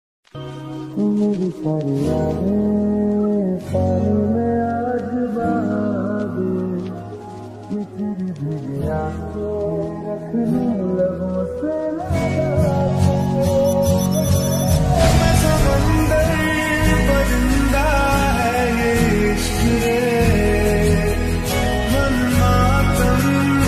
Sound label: radha krishna ringtone music janmashtami special radhe krishan ringtone bhakti